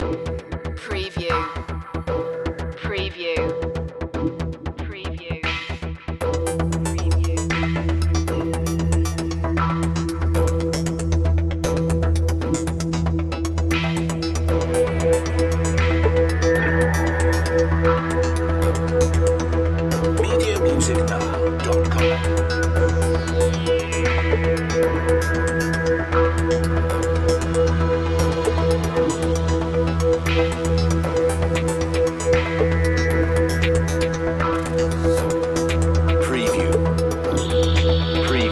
critical questions no drums

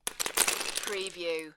Lego, few pieces drop on floor

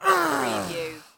Male Grunt, Human Injury, Pain, Vocal Ugh, Ouch

